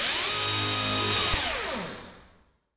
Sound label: neutral screw; battle